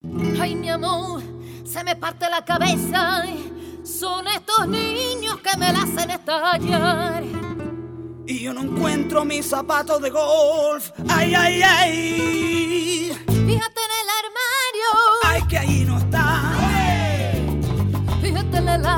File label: flamenco; portal palermo